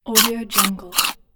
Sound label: man heavy breathing